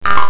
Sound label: BOING